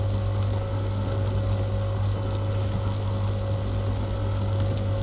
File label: Electricity loop